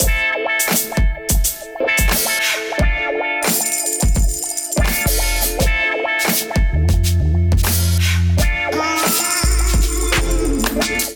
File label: Wah Soul Kit groove 86 bpm, Drums; MIDI Clips; Ableton; Chop and Swing